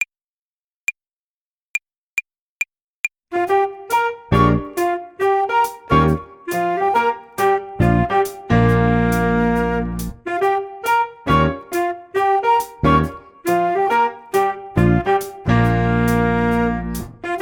Blues For Cool Cats, Play-along, Jazz Club Schlagzeug

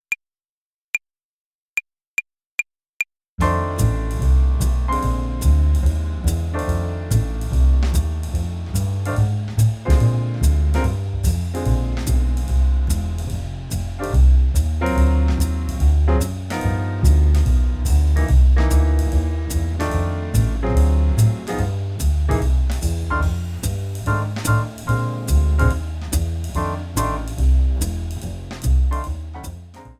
Blues-Tonleiter Übung 1 bis 6, Play-along Fast, Jazz Club Tenorsaxophon

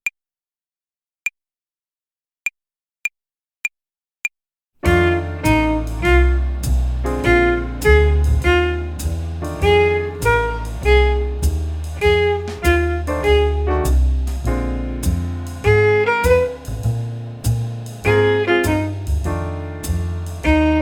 Blues-Tonleiter Übung 3, Play-along Slow, Jazz Club Violine

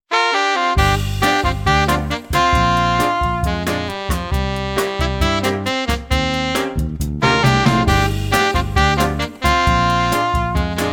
Jazz Club Altsaxophon

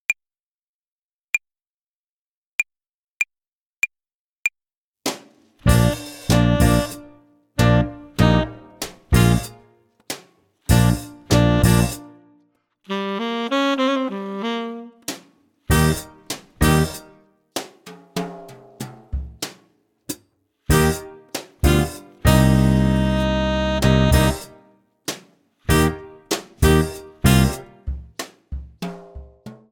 Tempo und Rhythmus Übung, Play-along, Jazz Club Tenorsaxophon

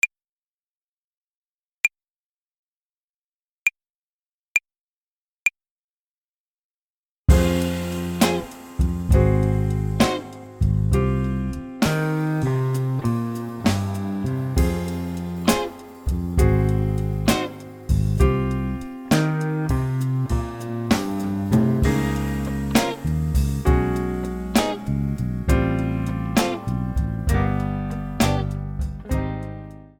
Play-along, Jazz Club Posaune

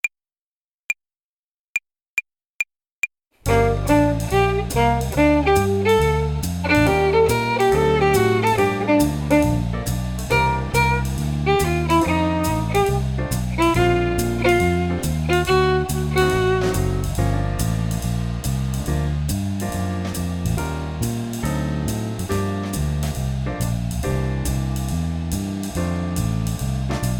Swing-Phrasierung Übung 6, Play-along, Jazz Club Violine

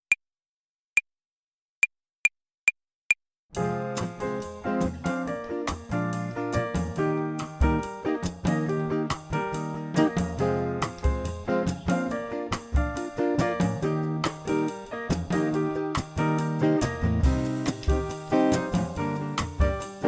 Akkordzerlegungen Übung, Play-along Fast, Jazz; Akkordzerlegungen Übung 1 bis 6, Play-along Fast; Bass

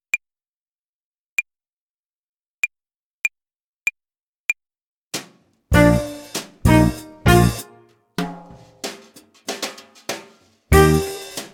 Tempo und Rhythmus Übung 6, Play-along, Jazz Club Violine

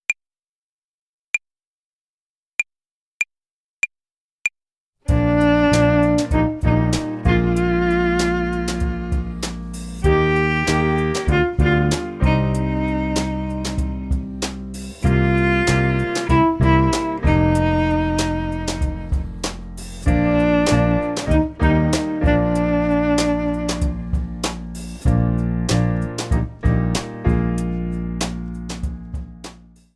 Tempo und Rhythmus Übung 1, Play-along, Jazz Club Violine

